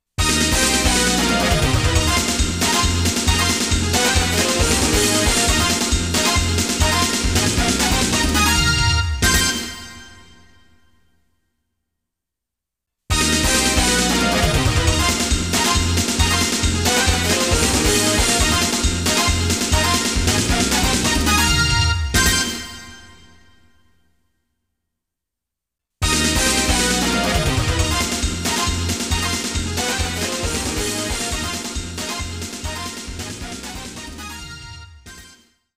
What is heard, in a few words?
Midi; Salamander Portable; PSP; Xexex